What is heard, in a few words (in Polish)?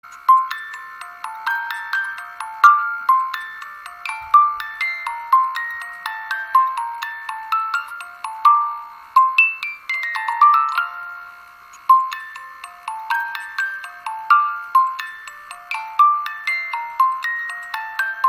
chopin pozytywka